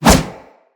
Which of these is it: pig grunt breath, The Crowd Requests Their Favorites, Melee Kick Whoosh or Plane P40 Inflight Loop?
Melee Kick Whoosh